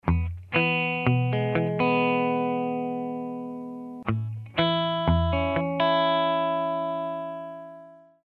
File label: new Pattern, finger style